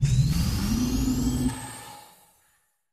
SPACE LIFT, elevators

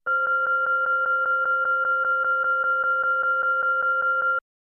Computer Beep Loop, beeps & tones

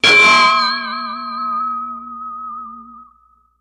Ding, metallic hit; chimes & bells

